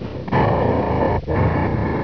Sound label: breathe, baby garg; svencoop